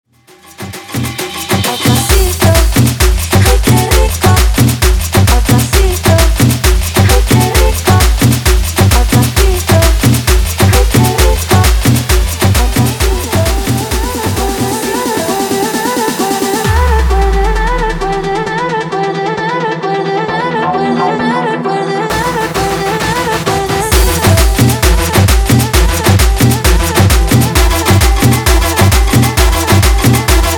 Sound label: tribal remix 132 bpm